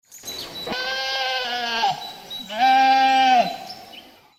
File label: farm sounds sheep